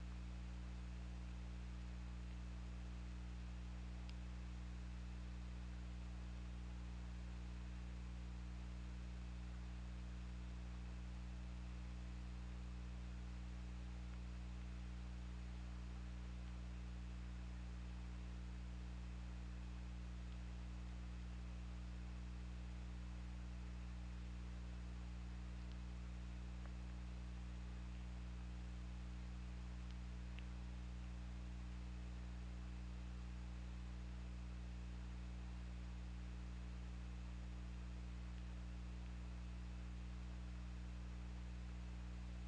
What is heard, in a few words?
Orca; OrcaLab